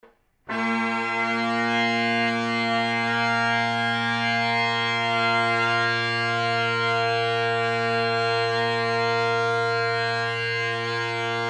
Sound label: drones; pipehacker; damned drones